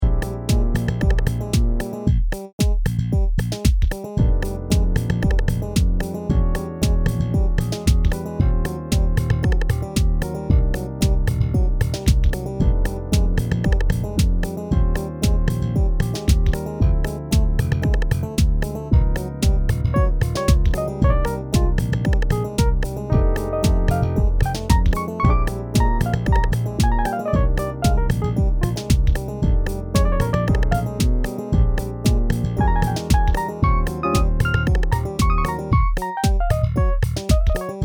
Funk Blues; MachineImpro

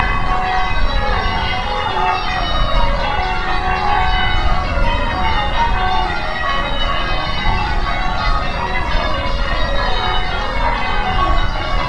bells

